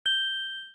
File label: camera timer; LG; P940-Prada-3.0